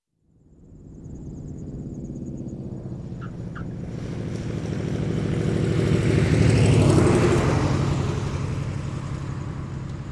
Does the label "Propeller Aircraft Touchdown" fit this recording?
Yes